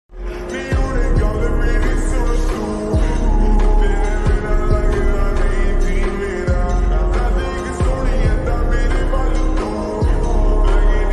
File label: lofi song